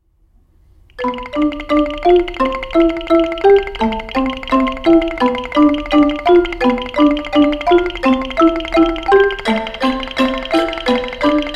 (Two Vibraphones, Two Bowed Marimbaphones, Wooden Xylophone, Two Bells, Handheld Wood)